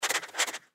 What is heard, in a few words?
giant rat sniff; npc